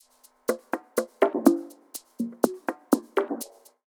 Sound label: JUST PERCUSSION; drums pads